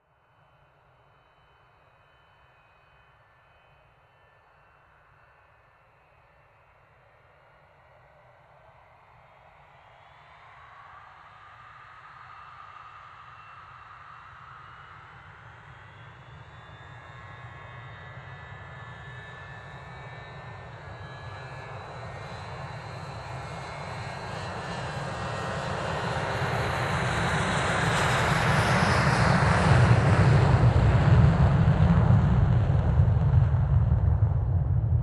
Airplane on a runway taking off

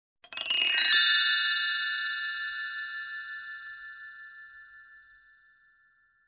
Mallet down glissando

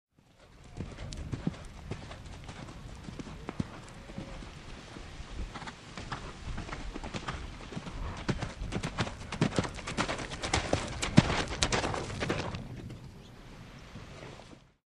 Horse Gaits approaching

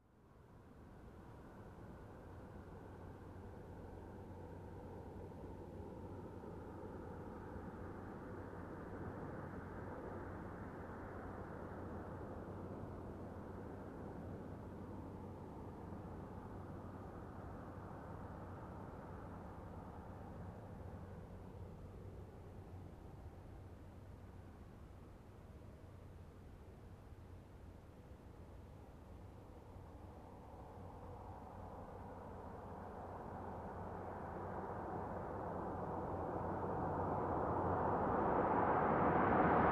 Truck approaching and parking

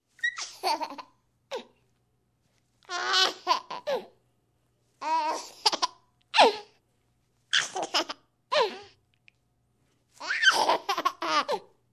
Infant Laughing and Giggling

